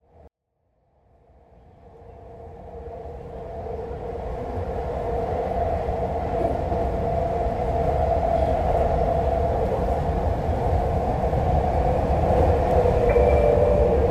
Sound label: heartbeat On the sky train